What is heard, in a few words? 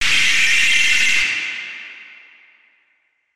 lego breaking bass boosted